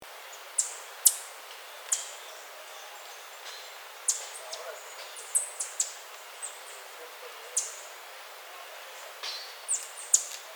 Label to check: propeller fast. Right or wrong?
Wrong